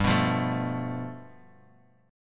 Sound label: G 9th, Guitar, Open F; G9 strummed